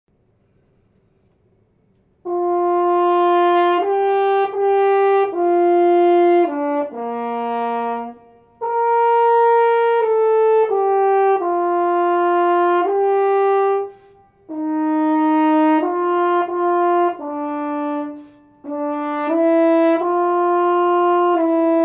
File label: Horn; All-State; 8th grade